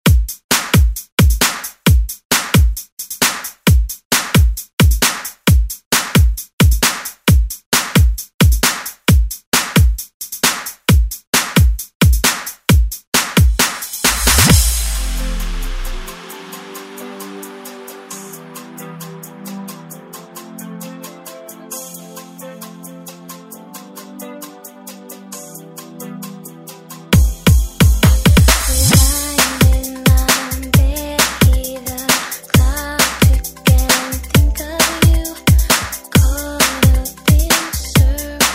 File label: Oldschool; Deejay Hit; Redrum